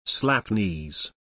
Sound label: slap knees; body percussion